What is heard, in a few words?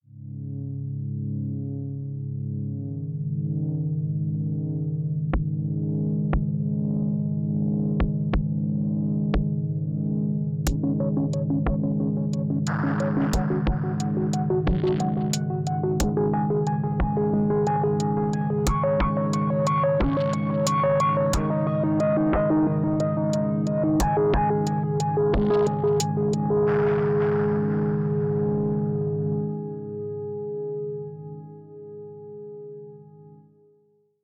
foldback